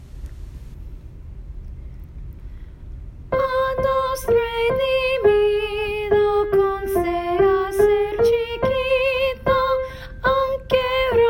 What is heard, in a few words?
solo